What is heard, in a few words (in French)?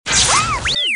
Robot